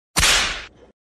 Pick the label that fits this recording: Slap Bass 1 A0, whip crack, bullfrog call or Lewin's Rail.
whip crack